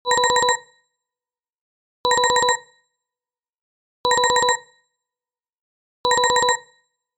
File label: cell phone incoming ring